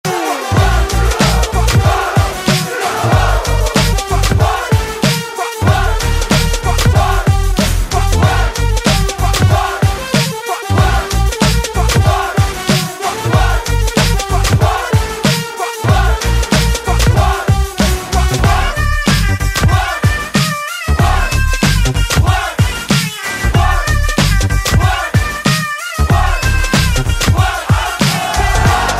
Malayalam BGM Ringtone; Alappuzha Gymkhana

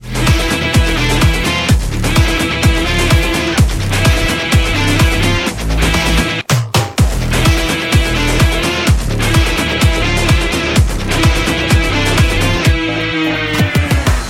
Bgm

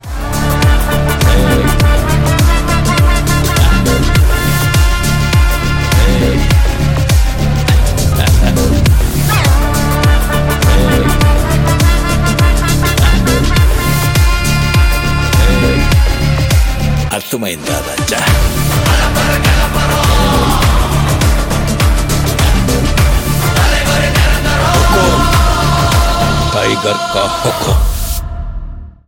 BGM Ringtone

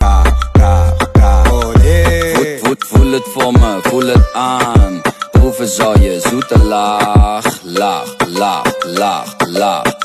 Ringtone

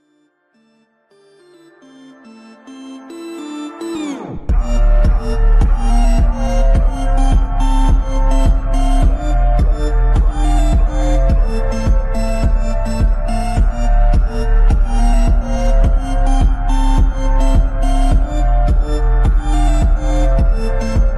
ringtone

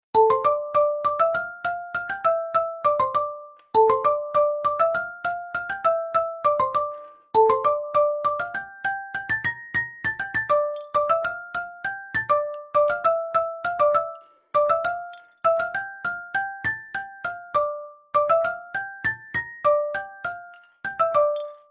Instrumental ringtone

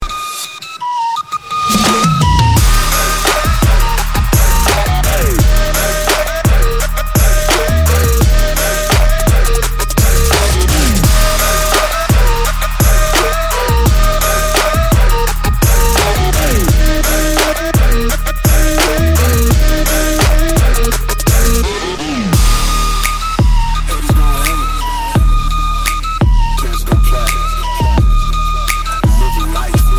Remix

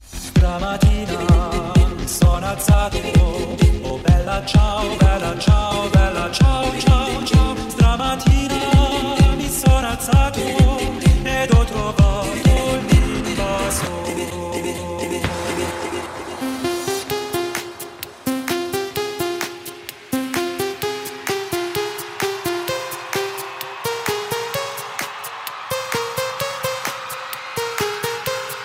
Bela ciao ringtone

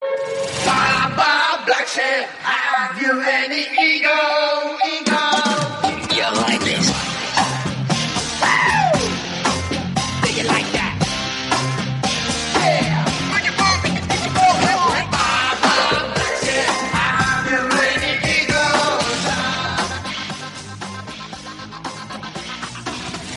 Ba Ba Black Sheep Ringtone

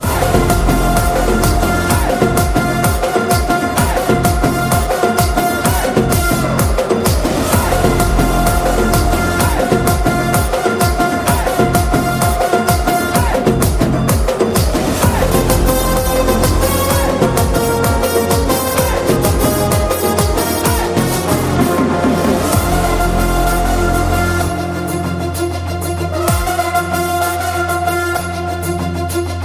Bull Fight BGM; Skanda

